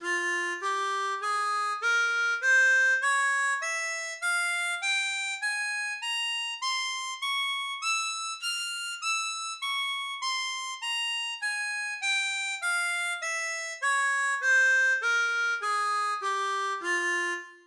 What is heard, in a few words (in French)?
Fa Mineur, Gammes, harmonica